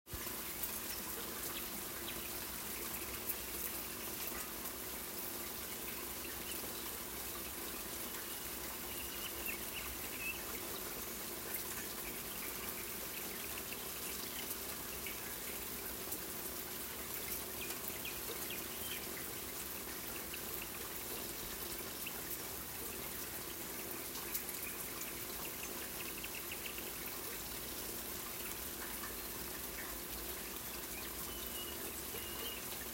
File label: rain with birds and flowing water sounds